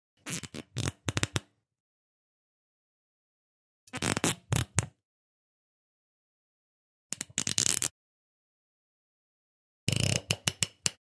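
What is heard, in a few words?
Stopper Cork Movement X4, corks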